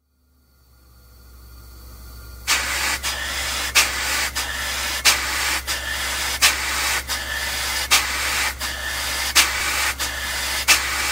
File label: Condenser; Zomboid; Biochemistry